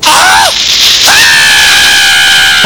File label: ahhh, male, radio